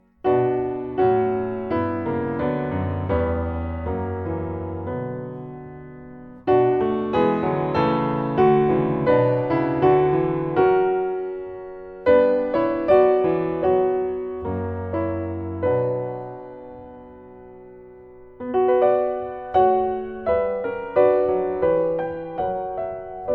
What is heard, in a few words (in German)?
Instrumental Song; Jesu Meine Freude